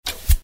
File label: shoot; tai lieu tieng anh 7 right on